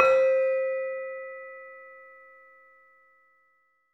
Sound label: LAMEL C4 -L; USB Soundscan vol.60; AKAI; LAMELOFO